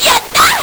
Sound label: LSDj, Nintendo Gameboy; 300 Drum Machines